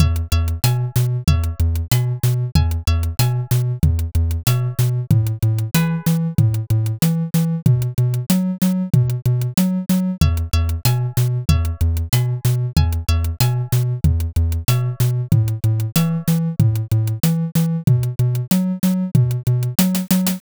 bgm

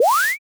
zoom

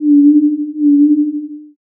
owl

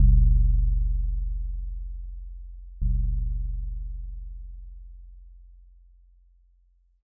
joya bell

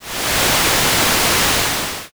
waterfall

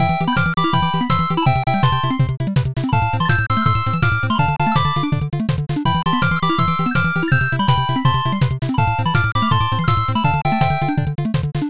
bgm drive

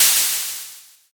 open hat

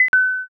pos

